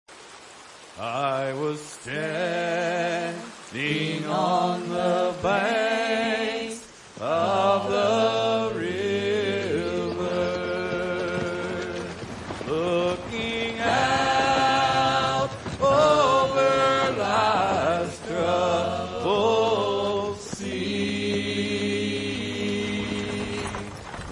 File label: choir at funeral; charlie's funeral